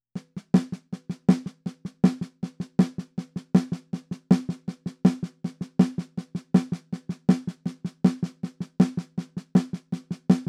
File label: perc intro snare